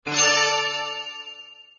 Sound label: fanfare; Pharaoh